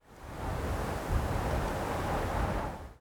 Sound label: wind gust